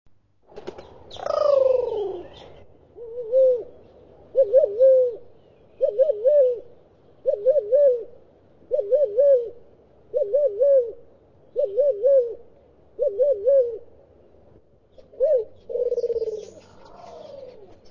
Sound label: African Mourning Dove